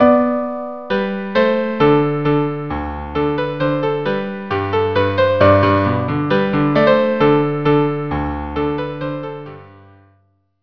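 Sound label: minuet violin cello duo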